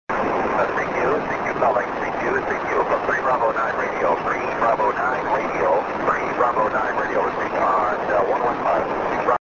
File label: 6m ssb